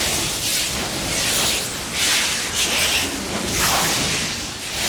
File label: hypercharge loop; LoL; jayce